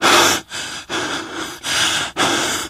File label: exertion minor, survivor voice, manager; source engine; left 4 dead 2